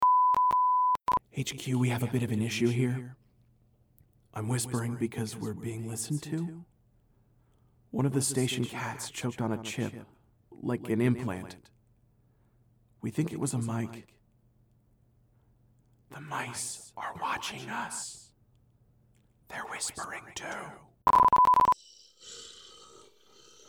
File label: Distress Signal